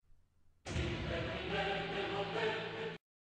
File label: drum set music; step brothers